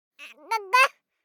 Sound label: BABIES TALK Boy 12 months Amguhguh; Baby Boom